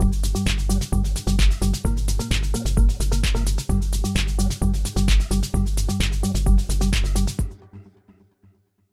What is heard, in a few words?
Riddim loop; 如何制作 Tech House 音乐